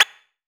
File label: PUPPY; akai; mpc1000; factorypresetsamples